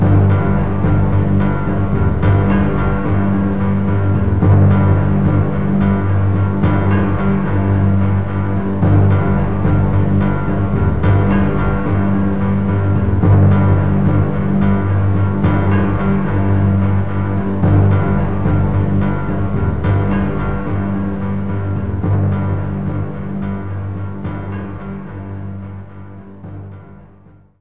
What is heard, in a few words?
Son'a Colony - Night Exploration; Star Trek: Hidden Evil; Windows gamerip 1999